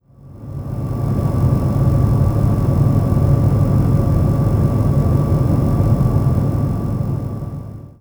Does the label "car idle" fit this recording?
Yes